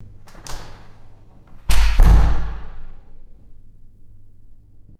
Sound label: metal bathroom stall door handling and closing; doors